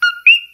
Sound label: coqui